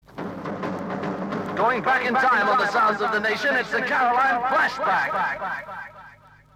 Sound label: jingle; Caroline; flashback